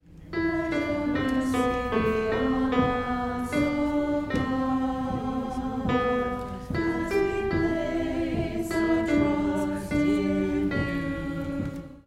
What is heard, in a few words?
alto; Gather Hymnal